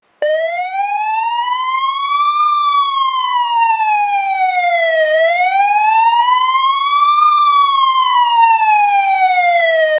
phone notification turnin; emergency

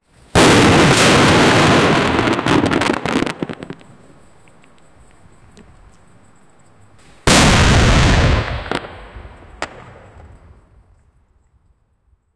a house explosion; disaster

